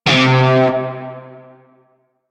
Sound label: Shawzin Oct Two C; Music; Warframe; Narmer Shawzin